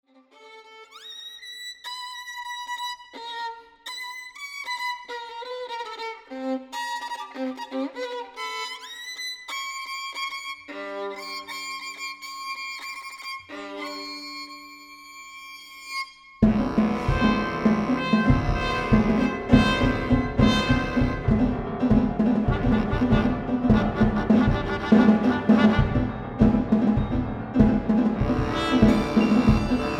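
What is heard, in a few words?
Violin concerto, from 2nd movement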